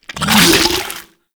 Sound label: Tendrils End Burst; Warframe; Infestation